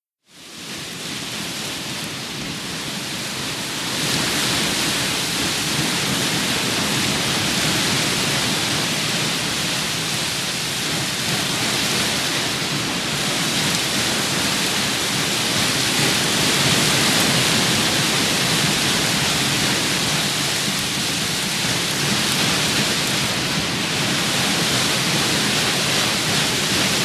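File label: Paddle Wheel Steady Wash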